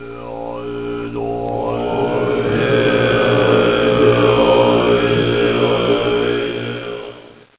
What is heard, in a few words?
monks, shaolin